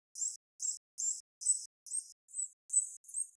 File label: Cuon alpinus squack